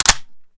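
assault rifle extended mag reload empty bolt release; gmod; viper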